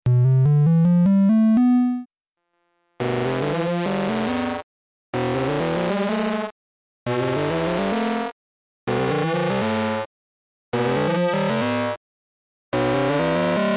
test notes, ring modulator; delptronics

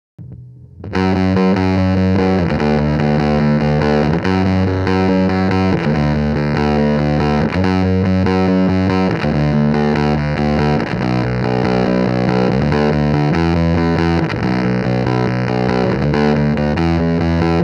bass mode 2 full fuzz

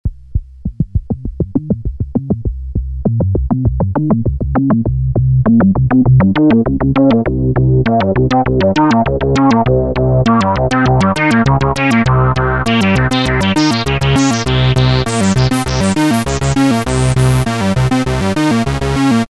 ATTACK BASS; mfb synthII

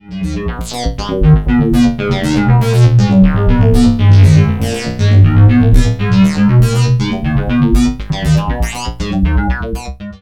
BBD Flange LFO; doepfer a-188-1

